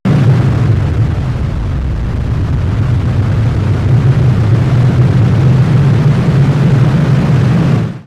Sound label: blast